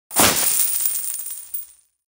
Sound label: coin bag; کیسه سکه